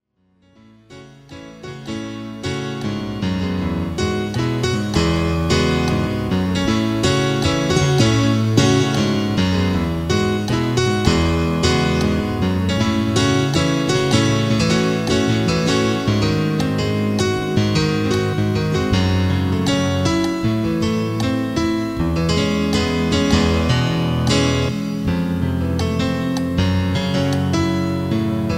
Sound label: Intermission Piano